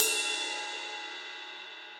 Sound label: Ride Mid Warm And Low; Drums; Drum Hits; Standard Hits; Ableton; Factory Packs; Session Drums Club